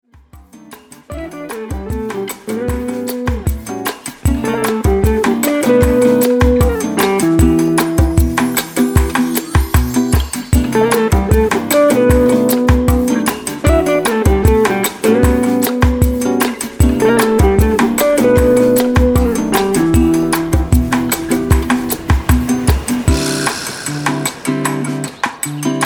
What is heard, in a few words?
sliding, from lounge to house